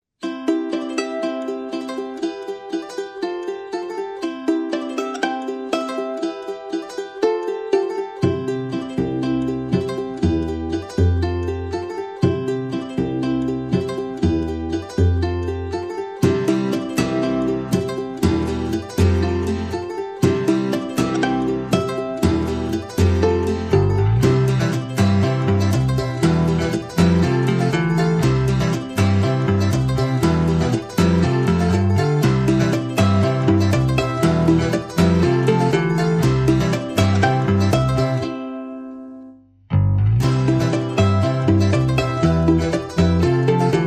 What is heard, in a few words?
No Melody No Drums